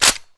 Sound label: bolt slap, weapons; gr9